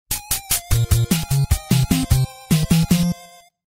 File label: nes music death game over; ninja gaiden